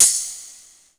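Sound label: POWER KITS, tambourine; 90 sSampleCDs; Crypton - DrumBase5000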